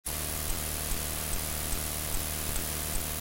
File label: noise floor; +36dB; iRiver